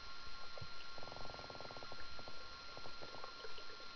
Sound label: ui 8t purring, fish sound purring, tivoli bay sounds